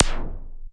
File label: noise zapper, electro FX